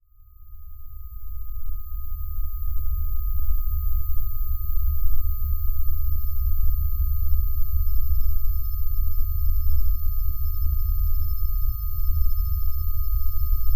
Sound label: AMBISONIC